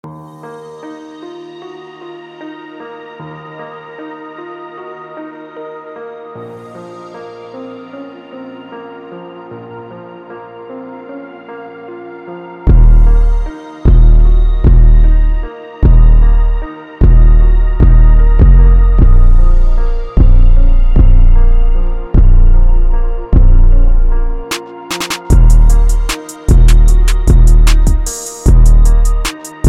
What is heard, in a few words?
Ambience